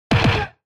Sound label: Hit Punch Dry